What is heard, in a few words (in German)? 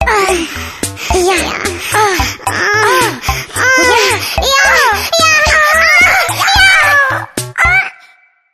orgasmus maeuse sex